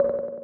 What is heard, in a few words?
ui key hold; Motorola ROKR Z6; ui sounds